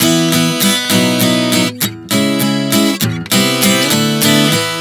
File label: E-B-C#m-C-D 100 bpm, acoustic guitar chord progressions